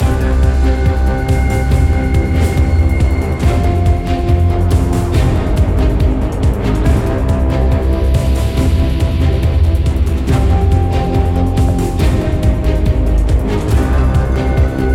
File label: Main Combat Loop; Onboarding